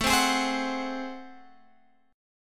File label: A Minor Major 13th, down, Guitar, Open G